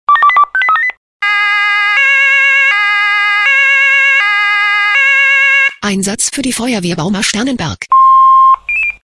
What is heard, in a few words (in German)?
SMS Alarmierungstone; FW Bauma